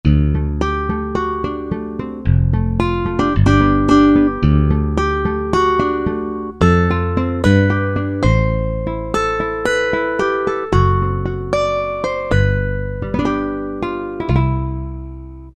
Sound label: Nylon Split, Korg MSC-05S Piano